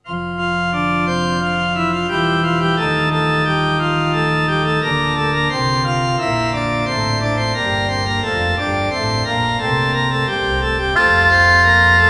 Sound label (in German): nun danket all und bringet ehr, orgel